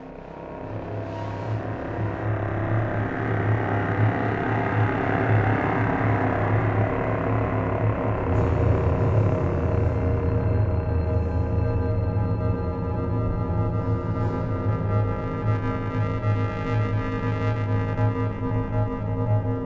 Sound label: Quest Ambient More Intensive